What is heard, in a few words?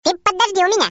grr